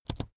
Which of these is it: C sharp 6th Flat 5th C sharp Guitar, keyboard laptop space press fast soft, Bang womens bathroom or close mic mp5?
keyboard laptop space press fast soft